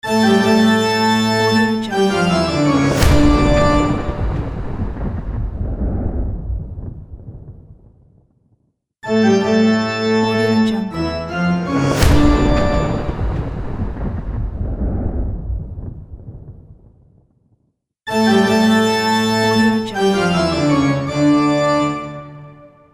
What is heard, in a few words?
halloween bach organ